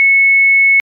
sine f2200hz

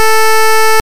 rect 8step f440hz

